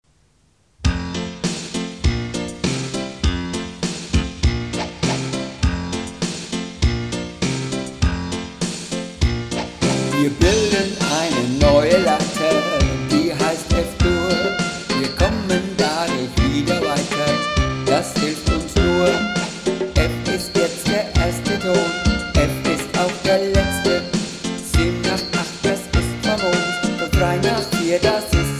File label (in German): F Dur Voice